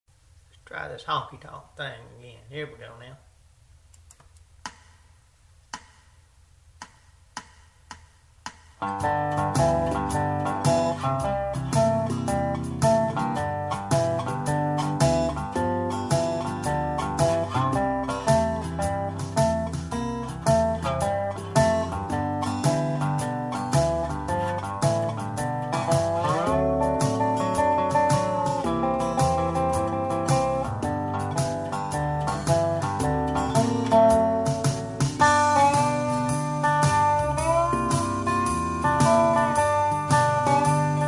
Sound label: Honky Tonk